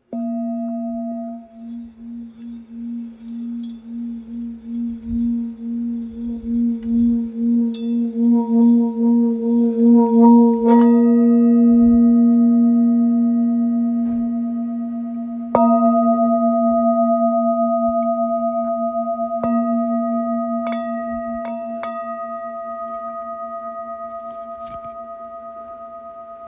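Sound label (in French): Full Moon la dièse, bols chantants tibétains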